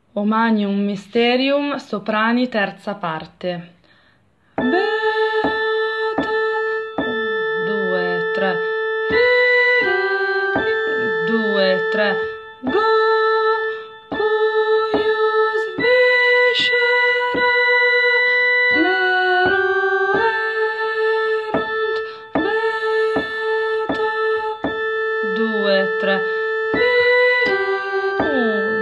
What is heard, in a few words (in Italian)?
soprani; O Magnum Mysterium